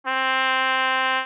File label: trumpet